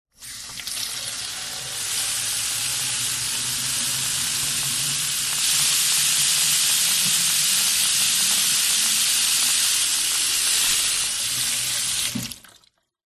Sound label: household kitchen plastic 1 litre bottle fill water from tap